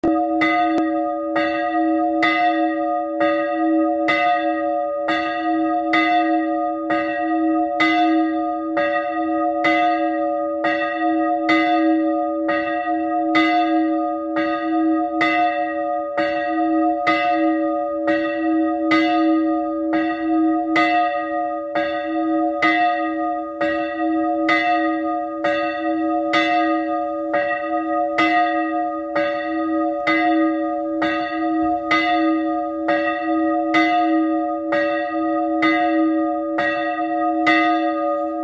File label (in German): Glockenlaeuten alt; Es enthält das Läuten der alten Glocke; Stahlglocke von 1892; So klang die Stahlglocke von 1892